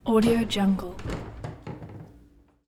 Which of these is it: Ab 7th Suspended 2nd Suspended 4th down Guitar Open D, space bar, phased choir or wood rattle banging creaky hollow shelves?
wood rattle banging creaky hollow shelves